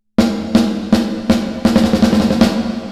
Snare; Reverb Compare

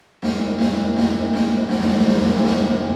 Plate Reverb

